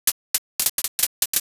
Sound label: sparse clap